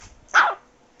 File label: dog puppy bark